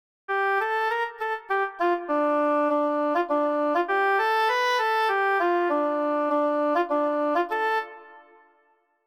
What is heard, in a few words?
oboe; garritan; sibelius sounds essentials